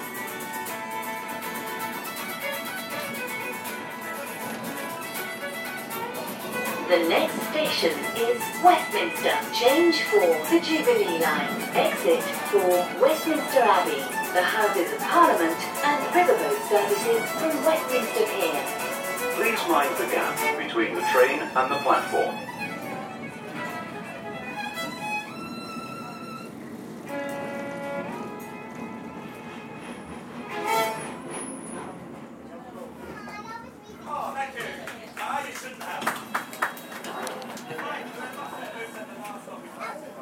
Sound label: irish folk music on the mid morning tube